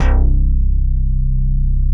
PPG BASS; BASS SOUNDS

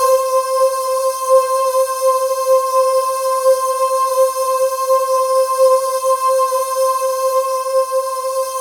C5, Synth Leads

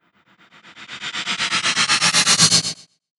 Reverse Fx; musicradar

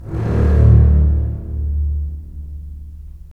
STR, STR Full Short; Roland - LA Composer vol. 4

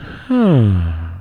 HAAHHH, HipHop Vox

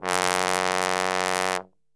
F#1 BASS TRB, Brass; Best Service; Brass Super Section

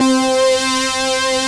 FAT FUZZER, TECHNO; Pro-Rec - Synthsonic; Z1 TECHNO

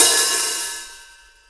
HAT OP, JAZZ; JAZZ#2; MIX2HAT OP

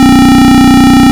rave beep C1; Best Service Alpha Dance vol.2

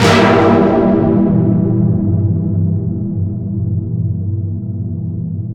Tense Keys, Drum n Bass; KJ Sawka; Drum n Bass & Beyond

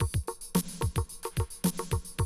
110, Classic Drum Loops

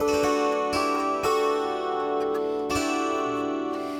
Kantele Strum Down; Sonic Foundry (Sony Creative Software); Friendly Fire

